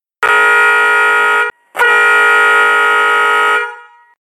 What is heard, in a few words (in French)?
son canadien moto, klaxon